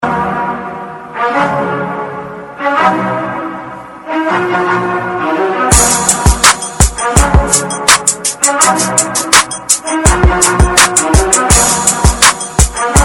cool music

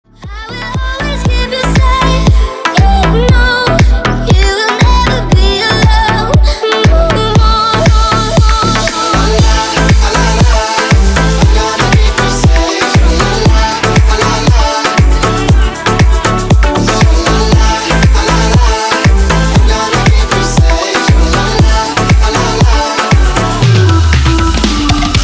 sax remix

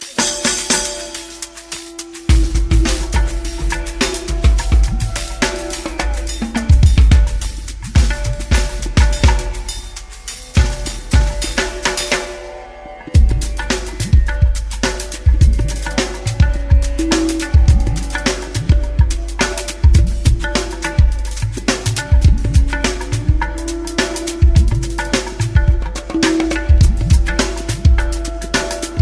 tabla beat

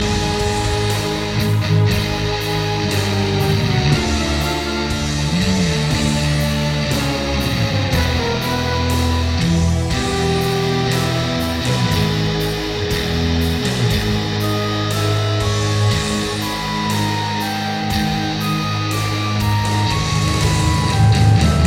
metal

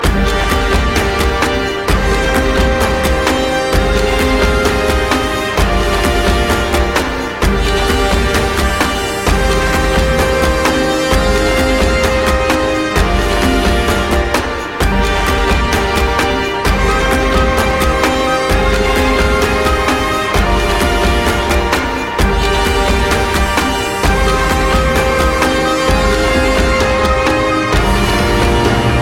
instrumental; assassins creed

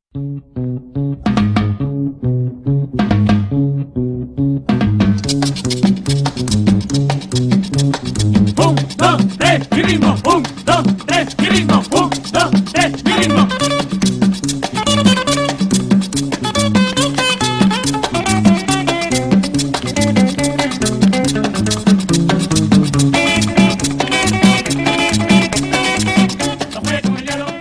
mexicano music

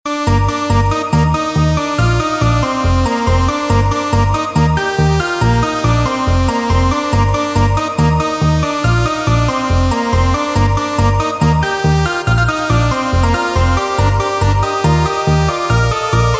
techno rocker

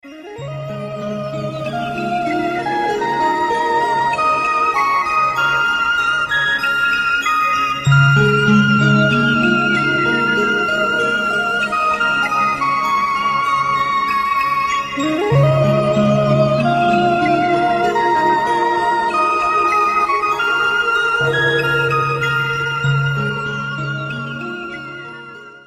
relaxing ringtone

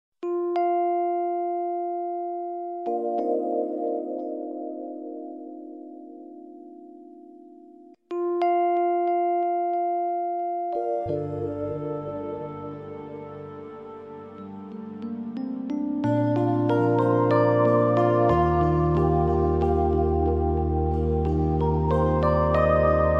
alarms tone piano

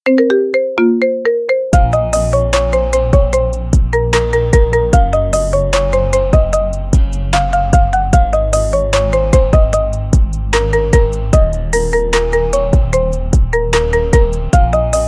marimba remix

